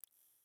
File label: water drip light; Rust